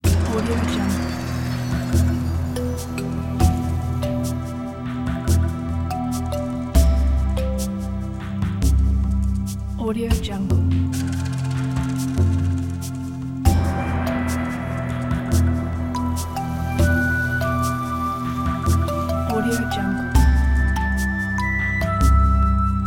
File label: mystical jungle spot; griptionmusic